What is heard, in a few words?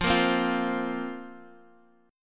F Suspended 4th, Guitar, Open D; x,8,8,6,8,x